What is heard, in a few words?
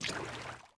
water wade shallow